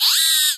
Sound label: motor mono, tool